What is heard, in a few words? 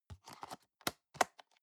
plastic box food small close soft